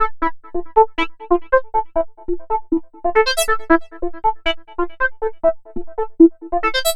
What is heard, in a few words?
Synth Lead; Loop Samples; eurotechno